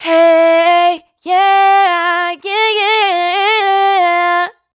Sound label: riff F, Dry Vocals; Raw Vocals; Slate Digital Omnivox Vocal Sample Pack